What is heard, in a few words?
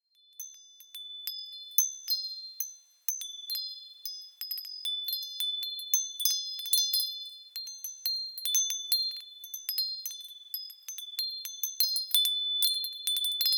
wind chimes bells